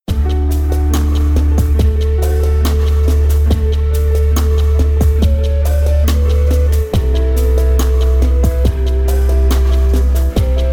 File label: Ambient Deep Zen Chillout 70 bpm